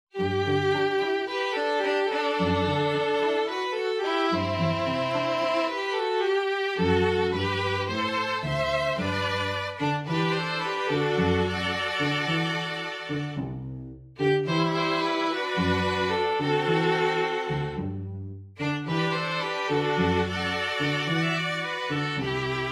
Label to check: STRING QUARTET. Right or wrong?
Right